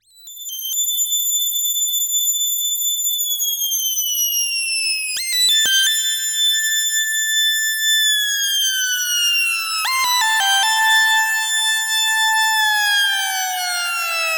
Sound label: Full Scale